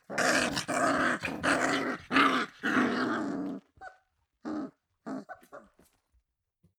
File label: puppy growl aggressive; natur-tiere; hunde